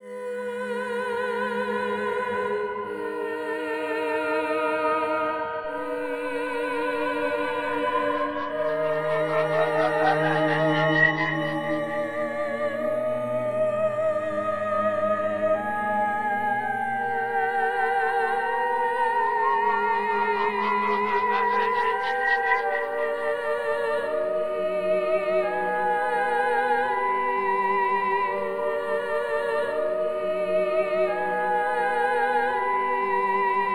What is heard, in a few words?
Night theme Synths; GGJ20